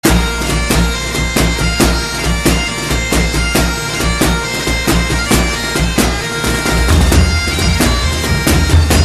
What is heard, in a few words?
bad pipes; taunt